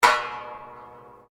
Hammer Dulcimer Strike Single, old Chinese Hammer Dulcimer; F; Musical; Strings